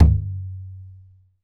MADAL, DRUMS OF IND

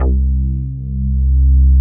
JX MOOG C3, MOOGY BS; Drums In Your FACE!

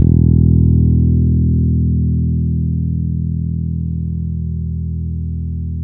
bass, E 2; Spectrasonics; Bass Legends CD 1

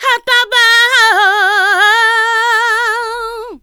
HA BA BO, Funky Vocals, ADLIB WOM; USB Soundscan vol.18

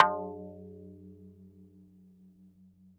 F#1, Yamaha VP-1; Music Store; 90's Sample CDs; MIYABI

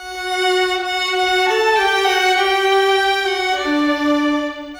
Violins; Sonic Foundry (Sony Creative Software); Orchestral Series VOL-4 - Rock and Pop

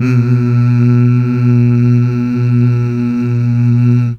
Mmh Male, CH BACK

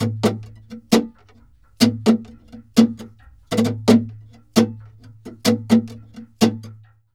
flamenco guitar, duende fx; ProSamples vol.46 - Flamenco

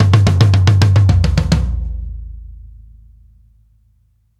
FAT 145 FILL, DRUMS LOOPS; BASEMENT DRUMS VOL 2

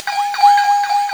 Flex Sine; Mo' Phatt; Digital Sound Factory Mo' Phatt

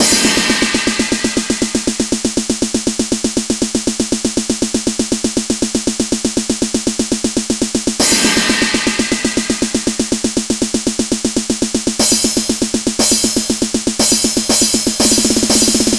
Snare Roll, Trance; Sonic Foundry (Sony Creative Software); Trance NRG VOL-2; Drums

